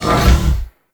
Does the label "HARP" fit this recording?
No